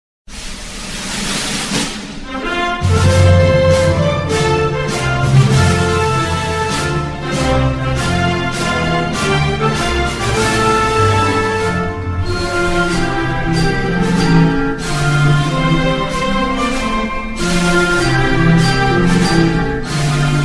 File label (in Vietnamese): quoc ca viet nam cong hoa karaoke